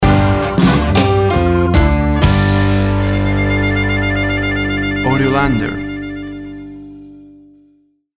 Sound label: The Way You Walk Stinger